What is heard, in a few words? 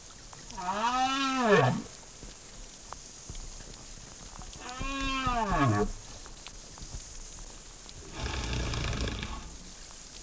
south right whale vocals; archipelago; cornell